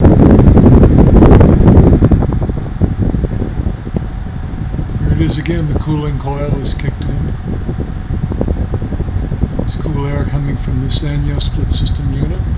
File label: Sanyo Split Interior; aircond